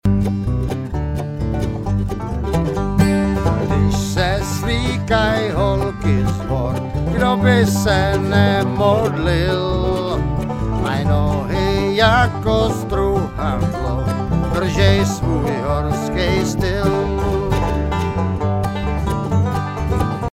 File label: vocLead, bluegrass